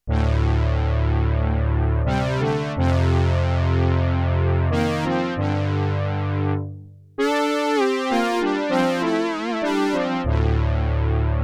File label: Fat Fifth, Roland JX-8P